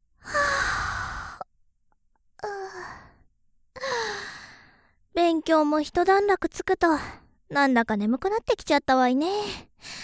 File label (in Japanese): あんたの耳、ウチが舐めて熱を冷ましちゃるわ…。(右), ASMR